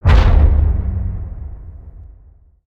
Pillar Impact

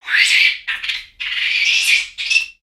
Pets Skunk Rage; creatures; Lego

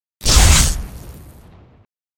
terminal shock

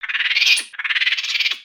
Pets Skunk Angry; creatures; Lego

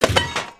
Binoculars Smash; destructibles

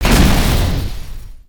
Impact

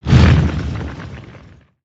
Earth Swing; Spinjitsu

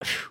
bored blow, emotes

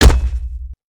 WPN Impact; creatures organic

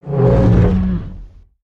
Disapprove, creatures pets

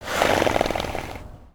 horse idle; creatures organic

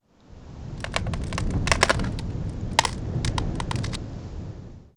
Tree Crack Snow

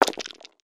random DICE

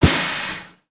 Full Steam Ahead Pulse

